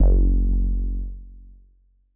M Elec Bass F#1; KG MegaSaur

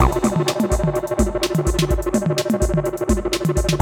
ACID JAM

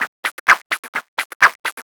FX Loop 128 BPM, House

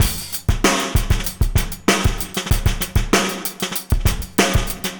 Skeleton, Drum Loops, Vintage; Zero-G Smoke

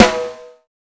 Fat Ringing snare; Drums; Snares

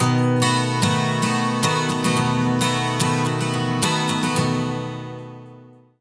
110 MAJ 12 STR, Acoustic Guitar Loops; USB Soundscan vol.04 - Electric & Acoustic Guitar Loops; AKAI

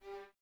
Violins G 3; Miroslav Vitous; String Ensembles; Detache

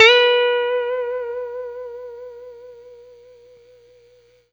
BLU BEND UP, Guitar & Bass; 90 s Sample CDs; Quantum Leap - Guitar & Bass

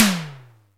TOM, Simmons SD-8 Drums

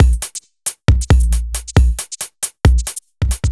Beat, 136bpm, uk garage

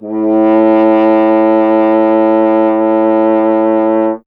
F. Horn; Miroslav Vitous; Solo Instruments VOL-2

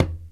LATIN KODAIK; Crypton - DrumBase5000; JAPANESE PER

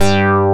Ring Mod G2; Digital Sound Factory Xtreme Lead

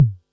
Bassdrum, Pearl SC-40

